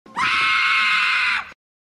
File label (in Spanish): grito; presidente; monstruos vs aliens